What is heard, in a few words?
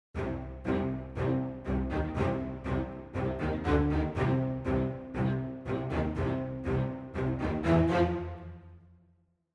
Adagietto Spiccato Ensemble